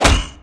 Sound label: Damage Stick